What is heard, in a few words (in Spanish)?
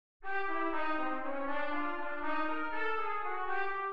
Min7 en C